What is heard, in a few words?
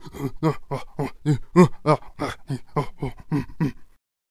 Traveller Attack Vocals